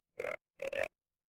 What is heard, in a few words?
animal frog